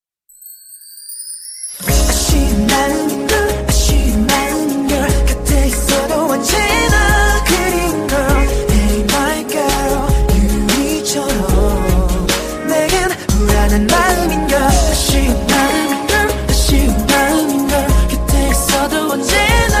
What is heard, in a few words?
romantic english love song tone